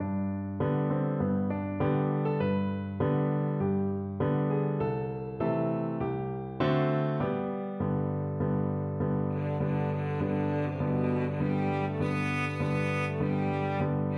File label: battle hymn, cello